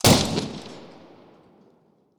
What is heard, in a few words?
Machine Gun Shot; blastmorph pinball; xbox series xs